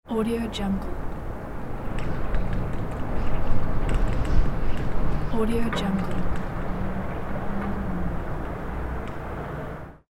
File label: wind draft indoor